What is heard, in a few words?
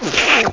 absorb, water